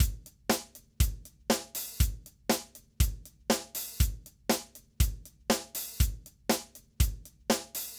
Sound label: acoustic kit raw